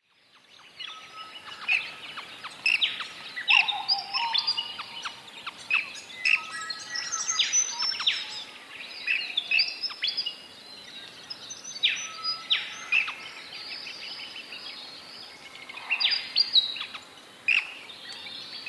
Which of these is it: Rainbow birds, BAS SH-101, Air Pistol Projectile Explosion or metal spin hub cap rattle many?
Rainbow birds